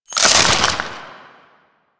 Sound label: Crystal Growths Attach; Warframe; Citrine